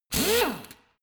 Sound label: impactor soft, bohrer